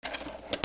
HOUSE

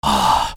PEOPLE

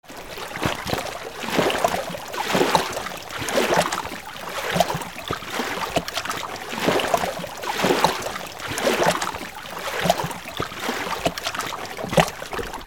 WATER

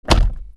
CARS

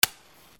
FIRE

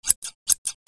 OFFICE

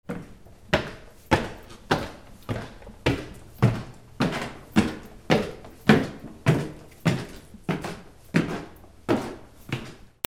FOOTFALL

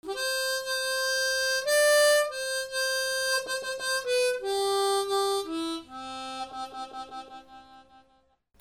MUSICAL

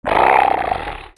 ANIMALS

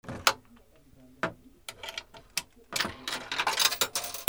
PHONES

